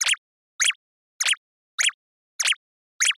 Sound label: cute footsteps, anime